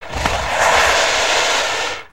Pet Crocodile Hiss; creatures pets

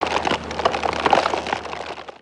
Siege Tower Debris; destructibles

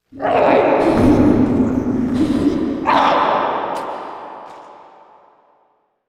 Cave Ambience; Skullken; ninjago

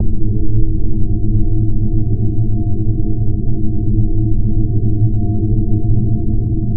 gloom mono, ambience; nimbus station

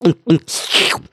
drink

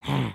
Idle Bobbing; creatures organic; Lego; Strombie

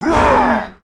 Troll Groan

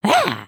Spawn; Lego Strombie; creatures organic

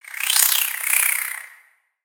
Spider Idle Look; creatures mechanical; Lego

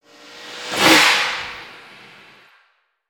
Car One shot Pitch Up

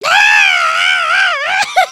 panic loop; emotes

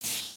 Pirate Sneeze Wipe

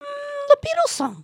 excited, emotes

